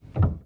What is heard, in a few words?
piano